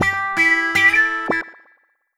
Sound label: Wah Wah Gtr; Guitar